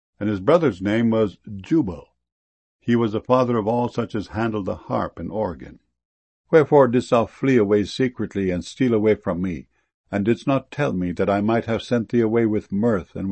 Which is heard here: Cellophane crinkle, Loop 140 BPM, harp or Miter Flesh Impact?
harp